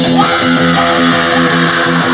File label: menu command; Idol